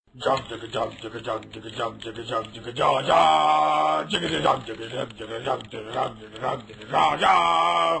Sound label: Bass; Strongbad